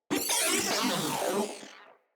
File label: Moa Vocal Death